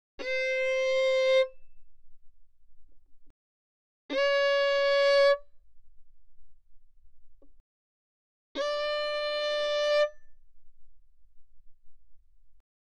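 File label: Violin arco fortissimo sul G C5 D5; Strings; violin2012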